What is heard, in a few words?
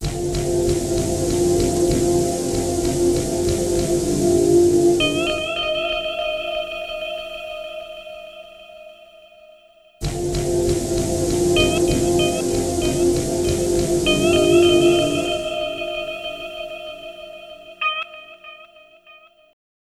Acid Mod Tonal-F, lounge, 096; Zero-G Kocktail Kollection; Construction Kitz